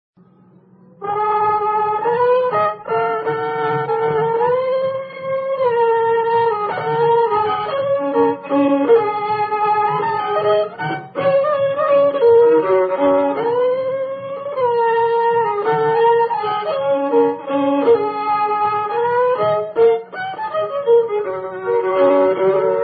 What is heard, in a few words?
drone waltz g